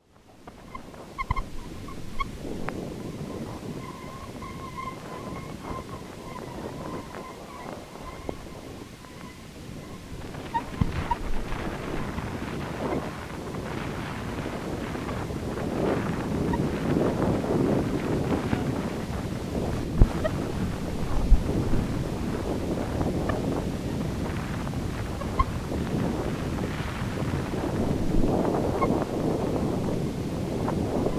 insect swarm

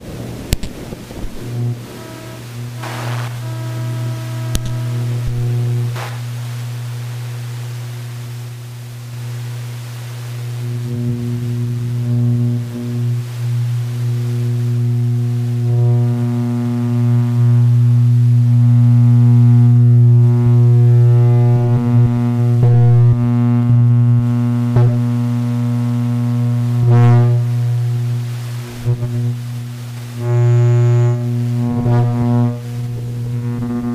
downtown light collage

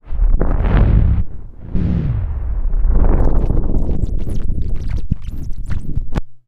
fabric texture

